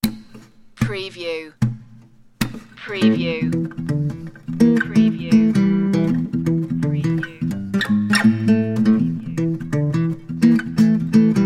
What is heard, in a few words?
bluesy solo guitar